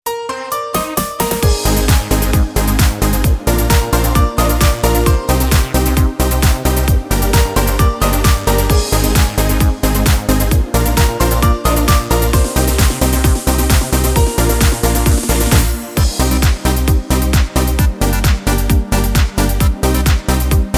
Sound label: Disco